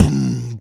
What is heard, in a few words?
Beatbox Kick, Human Beatbox; Vengeance Samples; Special Sounds